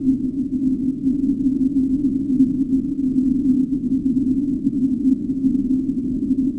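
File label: wind still; SFX; PC Gamer IT CD 38; thief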